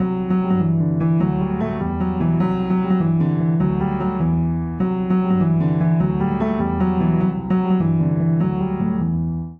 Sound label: Phrase Double Jig VI-VII-i E Minor 100 bpm, Upright Piano; Upright Piano by Spitfire Audio; Ableton; Factory Packs